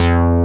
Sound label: SELECTED BASS; Masterbits; Dance Trax